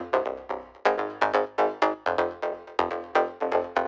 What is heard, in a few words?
Doubling Bass Before